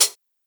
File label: Closed Hi Hat Sample E Key, Royality free closed hi hat sound tuned to the E note